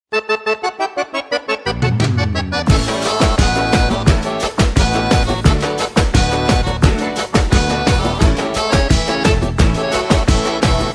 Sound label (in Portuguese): batidão gaúcho sem vinheta